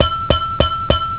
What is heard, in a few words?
BIKE BELL; wheels; Story Maker